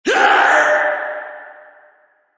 scream

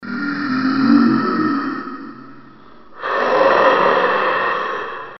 zombie; dod

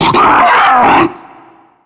charger spot prey, zombie; Left 4 Fortress

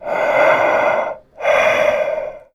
shape breath; tf; slender

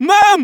jump; freak fortress 2; meemswarm; scout

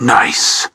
cheers; tf

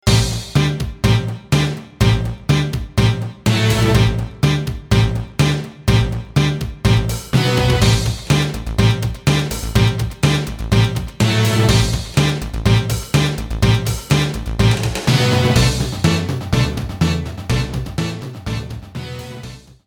tune; evolution

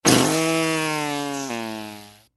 fart; hl2dm

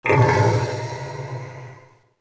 wretch tunnels amb idle; A Machine for Pigs

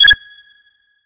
escape beep message; tf; axel nosferatu

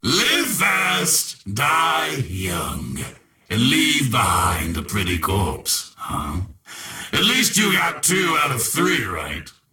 spawn; death wish

